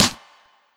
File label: airy sizzle snare sample g key